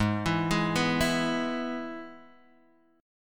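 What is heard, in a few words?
Ab Minor Sharp 5th chord, Guitar, Open G tuning; x,1,2,1,0,2; down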